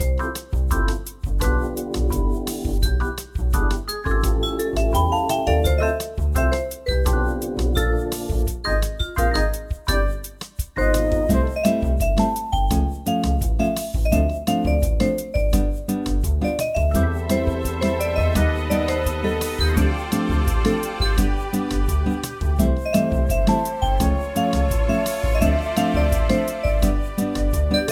Samba de Spire (Elevator); Sugary Spire

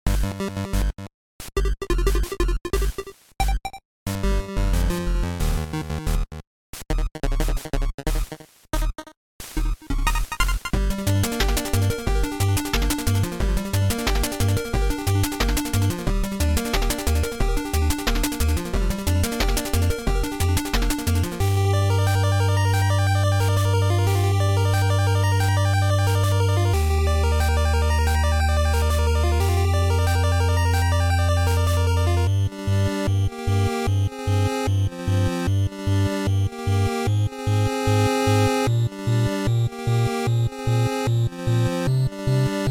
bgm outside

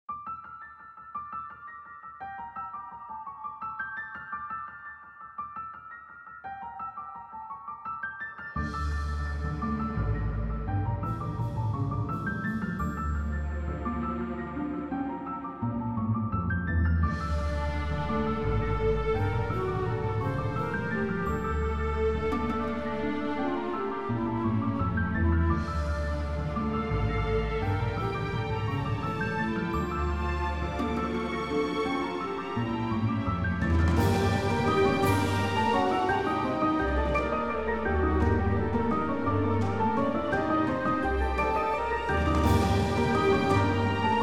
Desert Music; neko journey

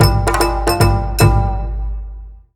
Feeling Comical

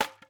wood hit; teardown; ps5 windows xbox series xs

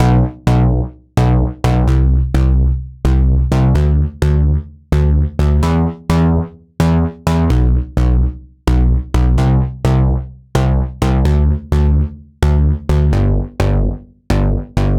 Poly Bass

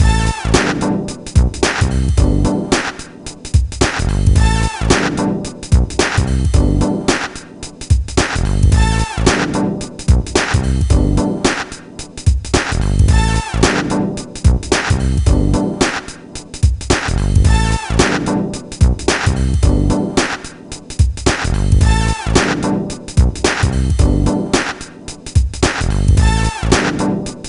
Ditty; NBA ShootOut 2000; PS1

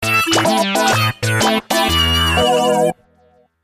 Result Failed; Muzzle

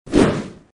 Attack; Sfx Baphomet

